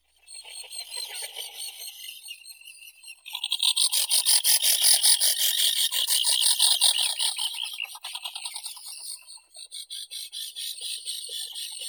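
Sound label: least auklet, Aethia pusilla; konuga kroshka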